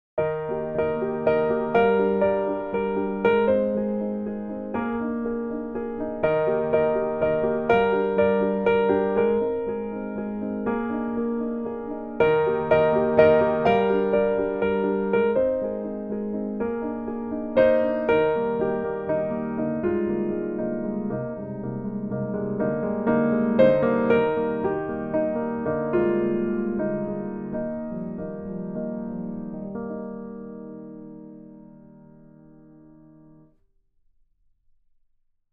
diatonic composition

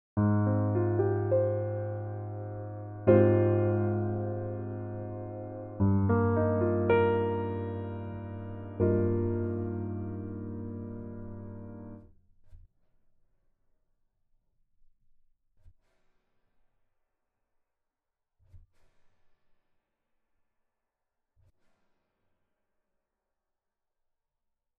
Crossovers Chords

